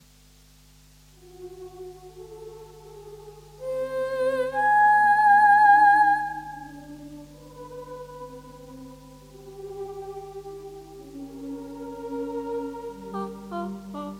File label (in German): Ohne und mit ReaFIR; MidiDino